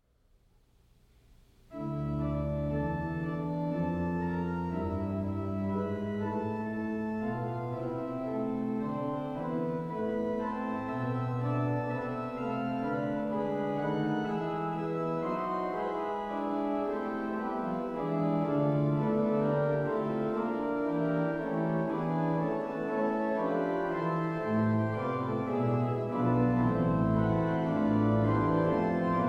Fugue, organ; Elisabeth Pintar

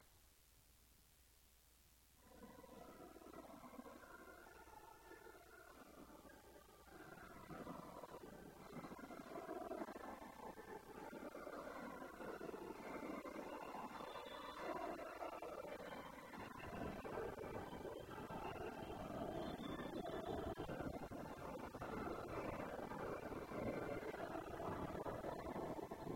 Short Fugue, organ; Hernando

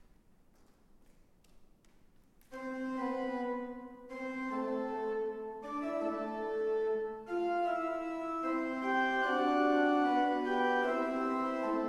Fugue, organ; Christine

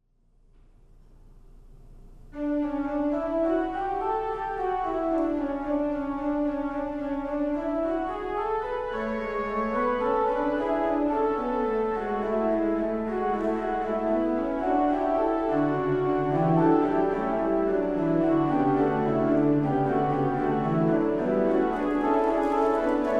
Fugue, organ; Figure Skating Fugue; voluntaries

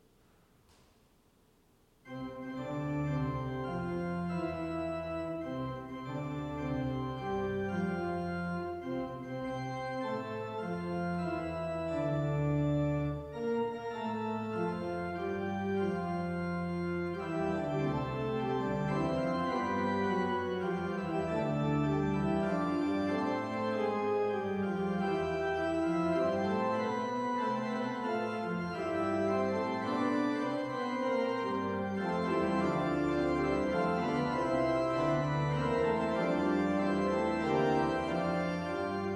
Happy Birthday, organ

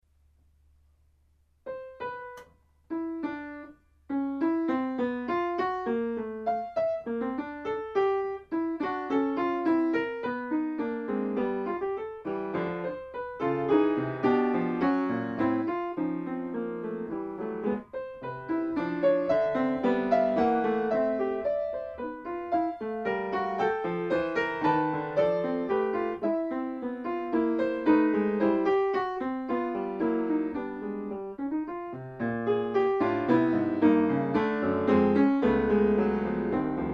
Polyphonic Studies, piano

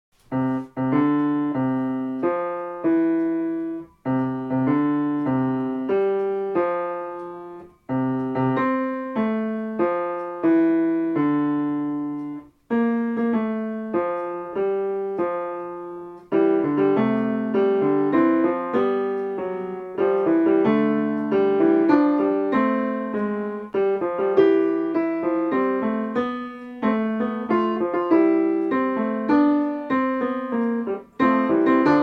Fugue on Happy Birthday, piano

